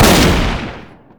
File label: jackhammer; weapons